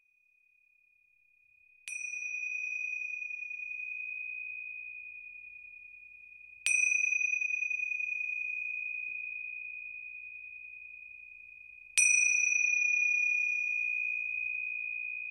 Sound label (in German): klang einer zimbel; Klangbeispiel einer Zimbel